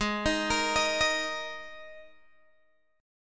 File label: Ab Suspended 2nd Guitar Open G